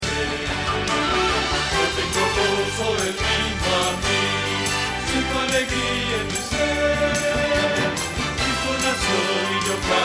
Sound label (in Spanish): Bajo